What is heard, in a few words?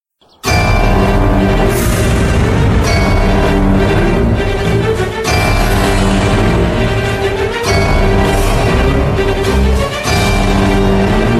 impending doom, music